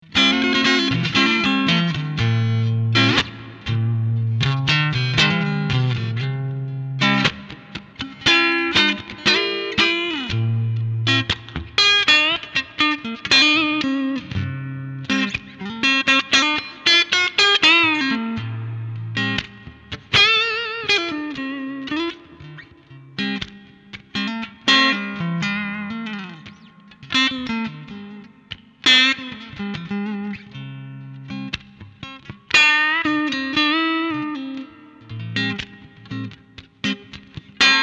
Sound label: Clean Strat, MultiFX; iRig UA